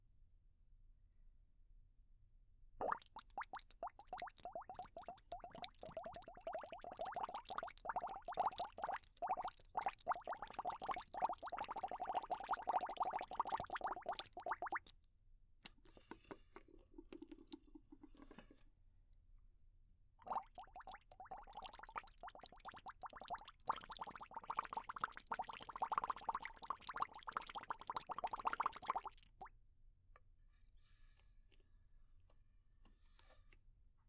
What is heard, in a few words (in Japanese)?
泡の音